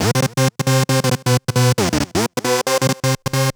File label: Kaossilator gated (130BPM); musicradar